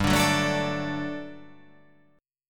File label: G Major 7th Suspended 2nd G down